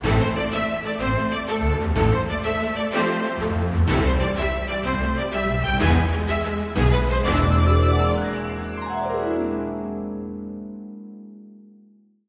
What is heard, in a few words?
free Spin Intro; robin hood